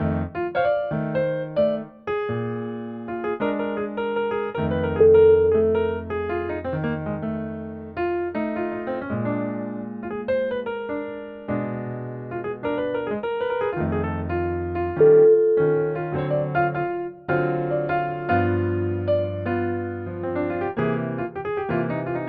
playing jazz

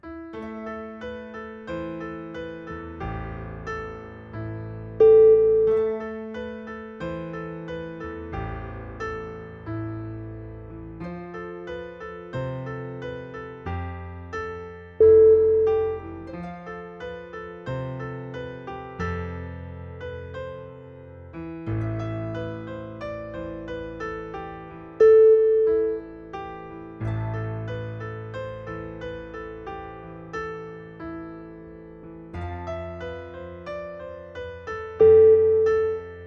mourning

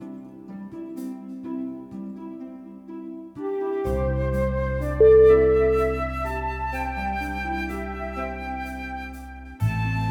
celtic romance

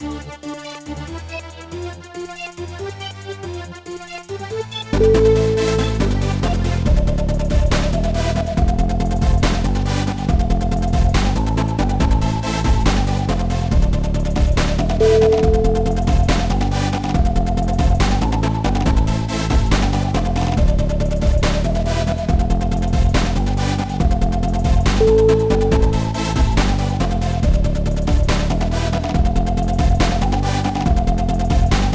halloween trap

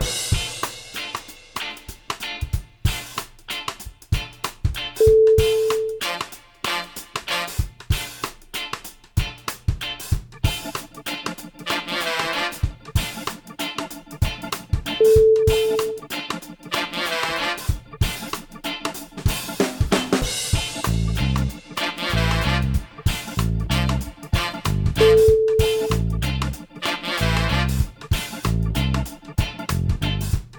reggae beach

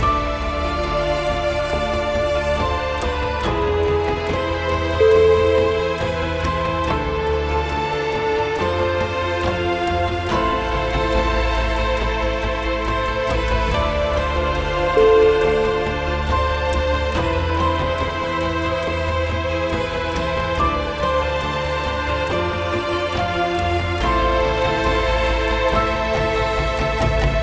chamber dramatic

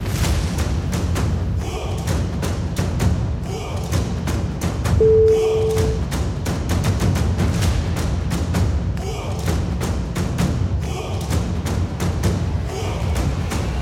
sport drums

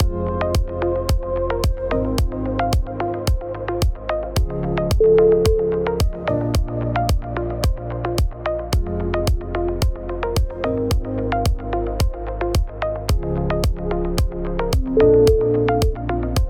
minimal tech

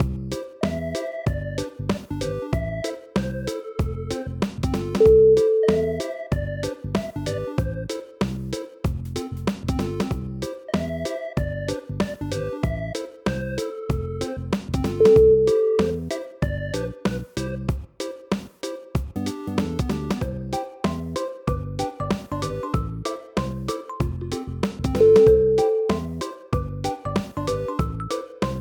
coconut beach chill

